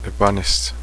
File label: fart; É-pánestse.; He farted.